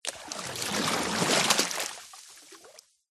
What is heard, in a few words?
nature water deep surface movement slosh